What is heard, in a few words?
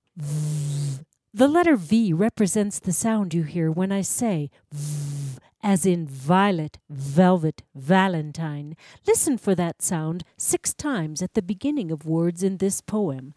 Wood Block